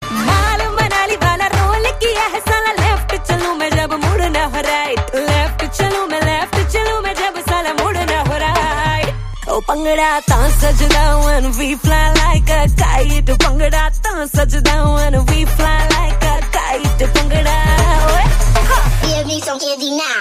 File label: bhangra ringtone; Dance; Veere Di Wedding